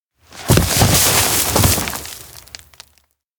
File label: carry roll fall; fumiya; anemoi